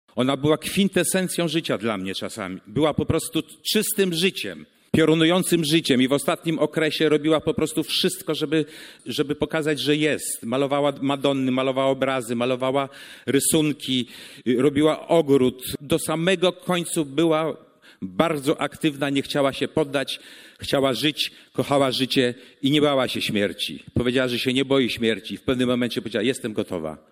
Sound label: kora